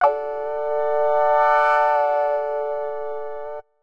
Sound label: 125 Clavy C, Keys, Nu Jazz House; PowerFX - Nu Jazz House